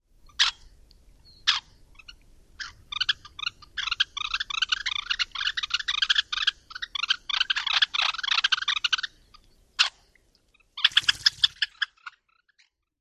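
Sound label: red-necked phalarope various interaction calls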